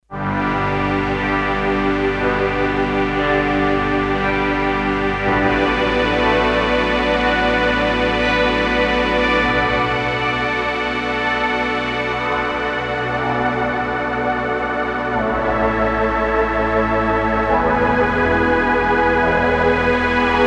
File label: Pad Layer, M-Audio Venom